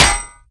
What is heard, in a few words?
spanner; weapons